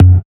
Percussive

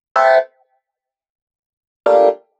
126 D F music, Funky Underground; Loopmasters; Funky Underground Vol 2

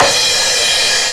Crash, Dubstep One-Shot Drums

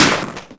Claps & Snares

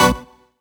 Synth Shot B; Mutekki Media; Vengeance Electroshock Vol.2

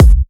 Bassdrum

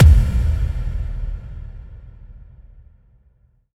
FX Impact

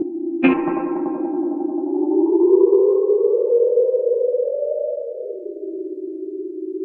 140 fx loop; Loopmasters; Jack Sparrow; Deep Dubstep Progressions; BONUS LOOPS

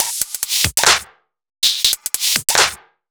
Bpm140 Top Loop, Dubstep; Perc Loops

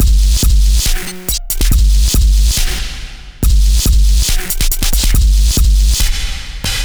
Bpm140 Spark Break, Dubstep Drum Loops

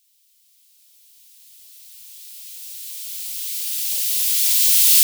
140 FX LOOPS; Dubstep; Loopmasters; The New Sound Of Dubstep

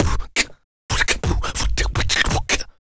Human Beat Box, Drum & Bass; 170; Loopmasters

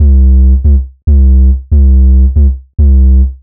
140 C BASSLINE, Dubstep; Loopmasters; The New Sound Of Dubstep; BASS LOOPS

